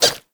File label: Shrink Baby Jump; Warframe; Nokko